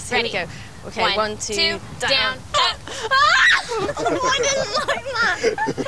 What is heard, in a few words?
jump